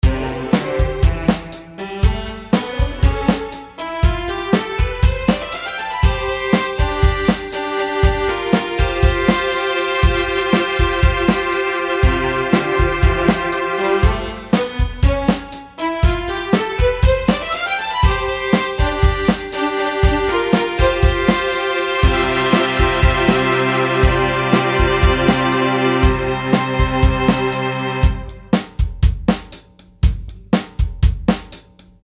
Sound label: BEAT ORGANIC, Beat Organic Drums Strings; Miscellaneous Loops